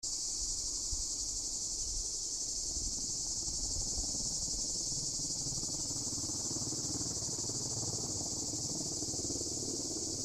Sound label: grece koroni fire